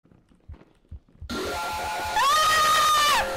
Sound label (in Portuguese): grito